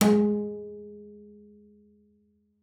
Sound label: G#3, bass pizz; Xsample VOL-5